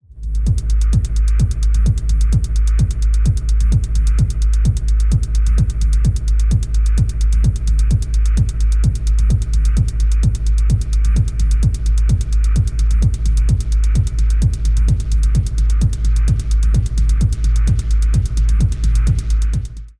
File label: Techno extract Rough